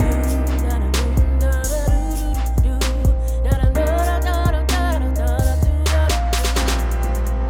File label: reverb off